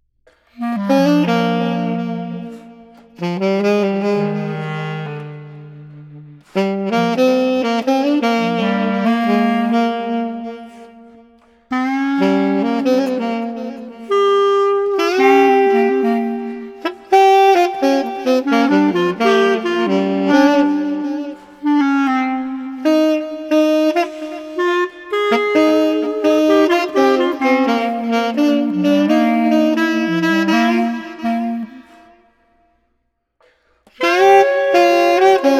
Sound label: Bluesy Sax Clarinet 85